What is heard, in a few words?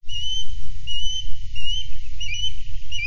Osprey calling; west lake; Ariel Foundation Park